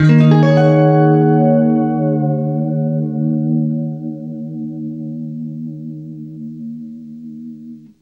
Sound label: CLEAN D#M9 L, Guitar; CLEAN MIN9TH; East West; Steve Stevens Guitar Samples Collection